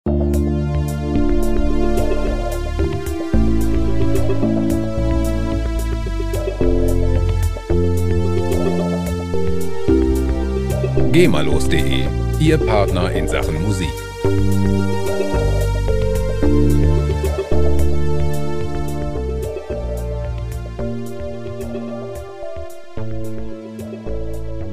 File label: sarabande